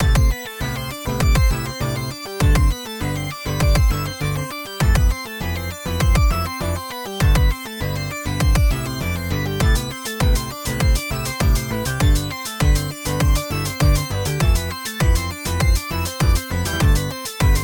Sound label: power min fast, (Sci-fi)ish small music loop; Up Against a Blob